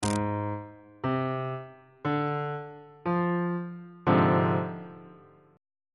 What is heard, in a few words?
f minor 6th 1st inversion